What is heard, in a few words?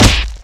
kick body; The Monster Plane; kolmods melee